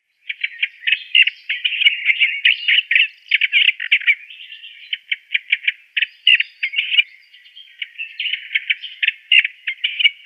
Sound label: Olive-tree Warbler song